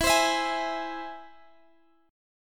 E Augmented E down Guitar Standard; strummed; capo 2

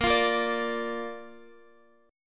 Bb Major Bb down Guitar; strummed; JGuitar

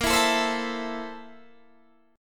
A Minor Bb, Am/Bb chord; Am/Bb strummed; JGuitar

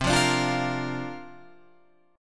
C 9th Suspended 4th, Guitar, Open F; C9sus4; strummed; 12,12,14,12,13,12

